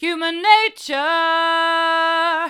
human nature hard, vocal

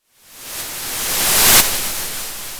Sound of waves crashing on a shore

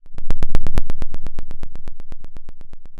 pop, Sound of a dinosaur

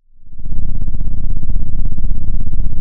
Sound of an engine revving

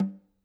Snr Off, drum

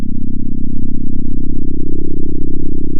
center, sound of a small motorcycle

